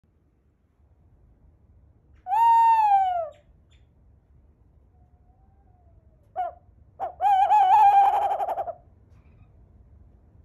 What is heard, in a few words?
Western Screech Owl Calls and Sounds; desert; usa